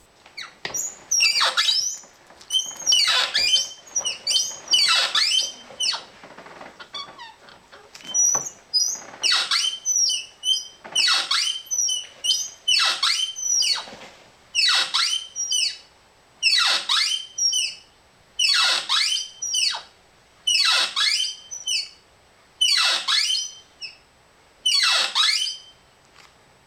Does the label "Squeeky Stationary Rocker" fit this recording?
Yes